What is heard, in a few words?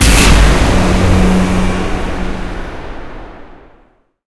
Music; unreal development kit